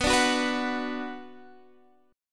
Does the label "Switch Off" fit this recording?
No